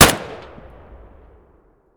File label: vpo209 fire distant; Escape from Tarkov